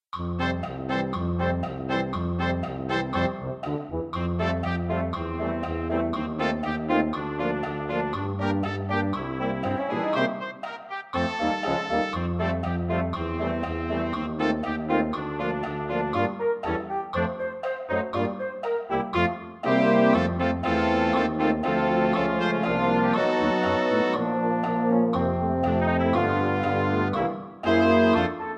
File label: cool bells that jingle score; holiday brass